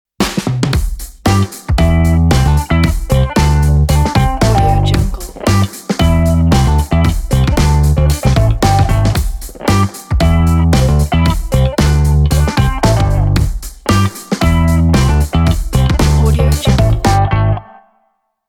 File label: funky logo